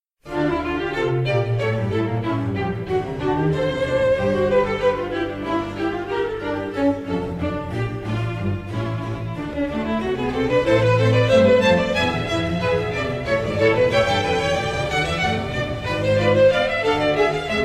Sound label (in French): Violon Extrait Bach; Concerto pour 2 violons; de Bach